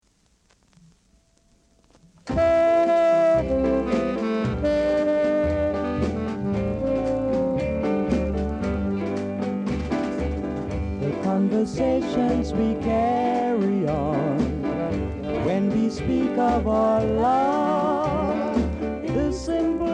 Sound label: blues